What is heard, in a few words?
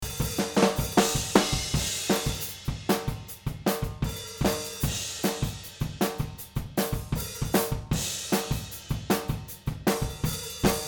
out of tune; SNR